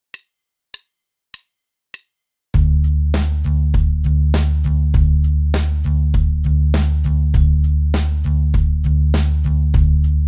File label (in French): contretemps et syncopes binaires tempo 100